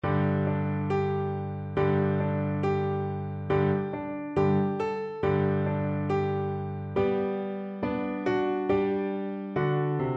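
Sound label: recorder karaoke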